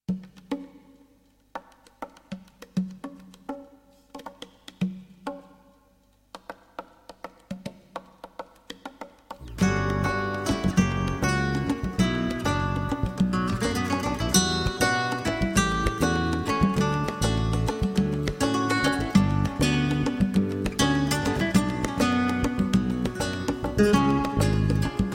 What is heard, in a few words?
Samba